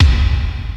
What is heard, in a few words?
Kicks Loud